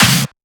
SnareClap; FLPRO

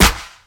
Clap

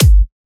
Kick, Kicks; FLPRO

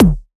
Perc, Percussion; FLPRO

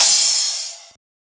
Crash

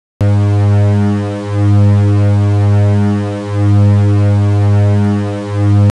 Vocoder

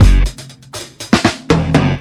Loop

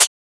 Closed Hat; FLPRO

